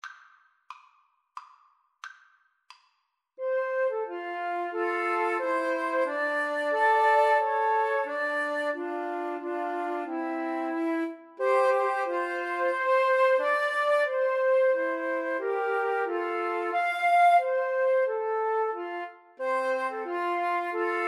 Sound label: Flute Trio